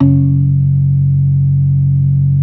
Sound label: B3 F#2; Best Service; Hyper Dance